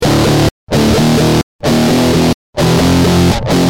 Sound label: G Jam, Guitar; Industrial